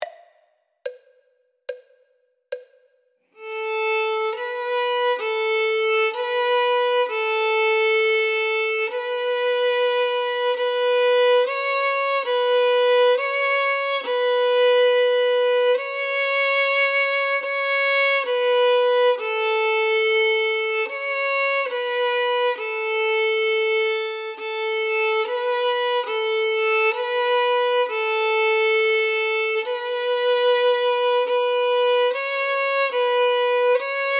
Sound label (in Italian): violino I